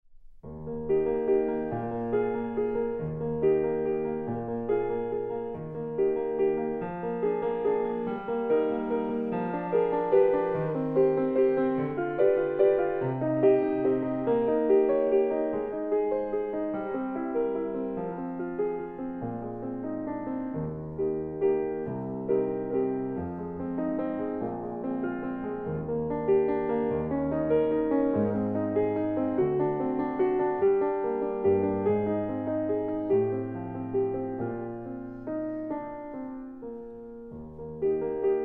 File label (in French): piano